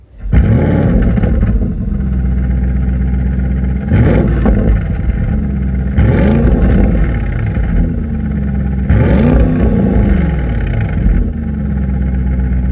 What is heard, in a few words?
Complete Exhaust System Audi RS Q8; evolution line; akrapovic; 4m opf gpf